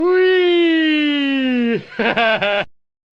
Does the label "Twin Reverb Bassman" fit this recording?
No